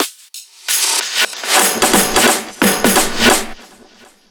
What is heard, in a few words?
EDM Drum Fill; FL PRO